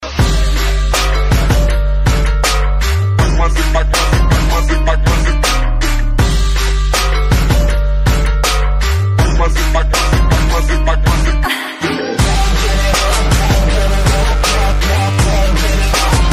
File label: trap